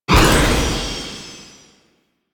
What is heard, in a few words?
Jetpack Stop; Warframe; DE; Vehicles; Veilbreaker